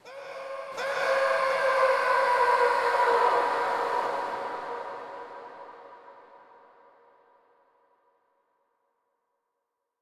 Human Fall Down A Well With Yell Both Dry And With Echo Stereo